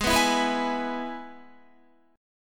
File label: G sharp Minor Sharp 5th, Guitar; G#m#5 strummed; JGuitar